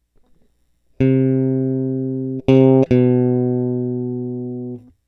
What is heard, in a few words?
Elect Guitar